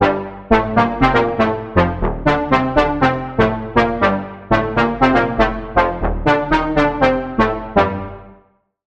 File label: Lead, Sylenth1; Lead - Boryokudan; Routed Sound; Sylenth1 Expansion - Noble Gangster Vol.1